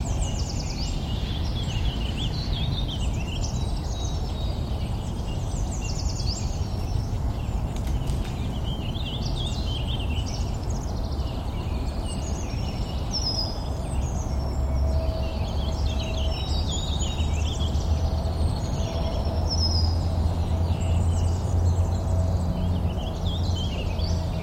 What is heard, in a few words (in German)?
Atmo Stadtpark